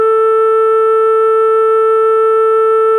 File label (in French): instrument la picolo, Acoustiq